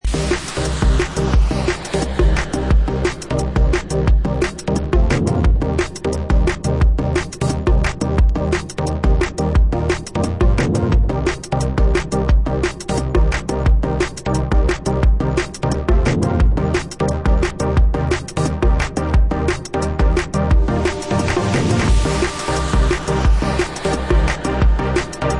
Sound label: Drum Bass